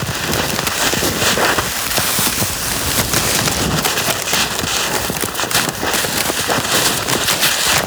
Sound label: Knee Slide Loop Ice